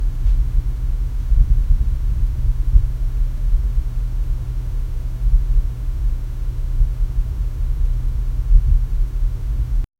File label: water lapping wind low